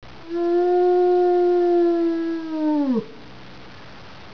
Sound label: howl